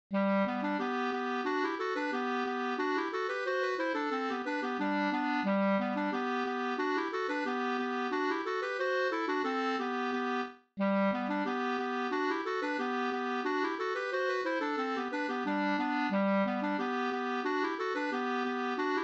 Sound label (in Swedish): engelska, 2st; engelska fr Slap e Fritz Oskar Brogren